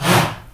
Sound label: HA, SHOUT, Heart of Africa